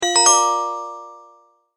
multimedia tone start

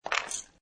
Tool Pickup; Generic metal tool pick up sound; Hand Tools

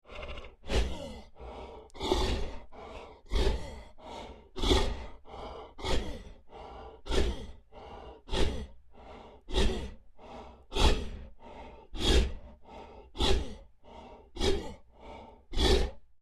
Creature Large Breaths Huffs Rhythmic; Scary Breathing; Big Room Sound

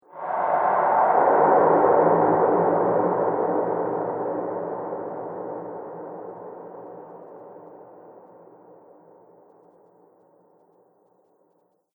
Crypt Rumbles Graveyard, Scary Soundscape

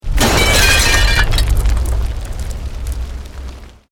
Explosion Medium Brick Wood Glass

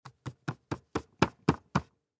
High Chair Baby, High Chair Baby Hit Tray; Miscellaneous Household

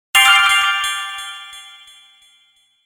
Logo Shimmer Twinkle Delay; Sonic Logo; Blastwave

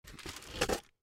Box Pick Up Tin Foil; Household; Pick Ups; Objects; Blastwave

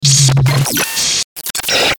Stinger Hum Beep Static Burst; Production Element Imaging Element Accent Transition

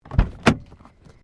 CAR SUBARU LIBERTY INTERIOR DOOR OPEN; Family members Subaru; Vehicles

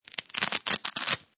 Velcro Pull Apart; Crackling

